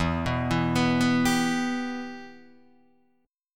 E-Minor, Guitar, Open D

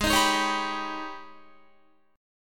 G sharp Minor Major 11th G sharp, Guitar; strummed; JGuitar

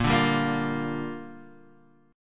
A sharp 6th, Guitar Open D

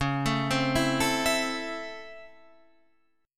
Db Minor Major 7th Sharp 5th Db, Guitar Standard; DbmM7#5 arpeggiated; JGuitar

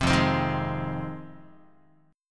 F Minor 7th F Guitar; JGuitar

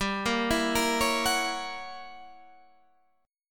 F sharp 7th G, chord; arpeggiated; JGuitar

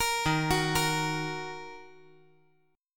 D sharp Minor, chord

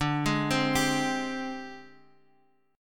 G sharp Major 7th Flat 5th

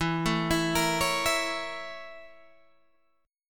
A sharp Minor Major 7th Flat 5th

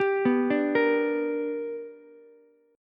B Minor Major 7th Sharp 5th B, Ukulele; BmM7#5 arpeggiated; JGuitar

